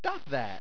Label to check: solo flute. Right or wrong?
Wrong